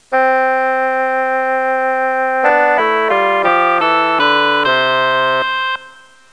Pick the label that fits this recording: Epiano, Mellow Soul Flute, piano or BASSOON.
BASSOON